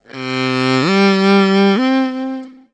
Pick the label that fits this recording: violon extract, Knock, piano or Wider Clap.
violon extract